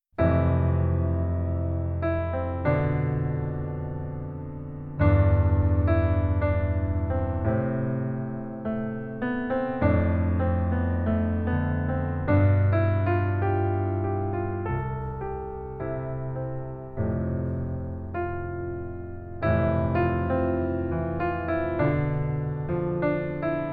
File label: Sleepy Piano Crickets